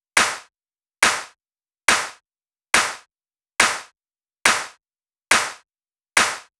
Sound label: drum Loop 140BPM Clap; Vengeance - Essential Clubsounds Vol.5; VEC5 Drumloops; VEC5 Multi Loops 140BPM